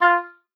F4, OBOE; Xsample VOL-6

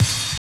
BD, Drum & Bass, 160 BPM

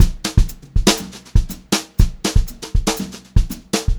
Brushed Drum Loops; USB Soundscan vol.39; AKAI; 90 s Sample CDs; 120 HOTROD

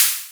OH Noise, hithat crash cymbal

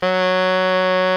BARI MF F#2, Sax; Giga Samples Collection; BARI QUICK

